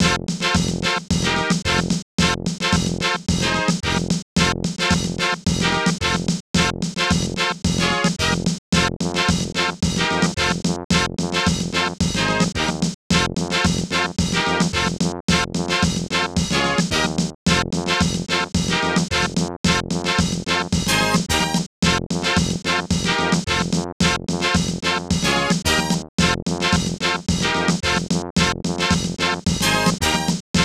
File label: Pause Menu; Bill Walsh College Football; SNES